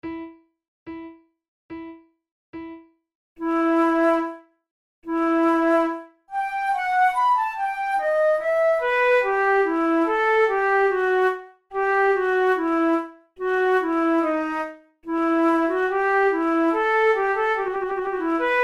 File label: prelude, flute